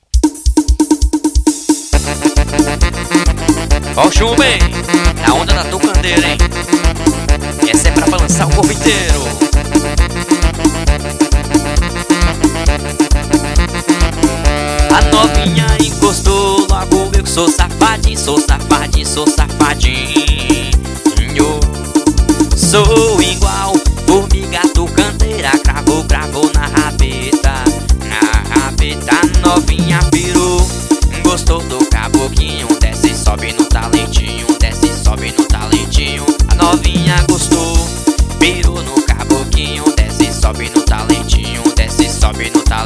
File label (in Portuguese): forró